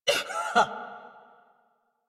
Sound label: Pain B; Warframe; Lasrian; Rusalka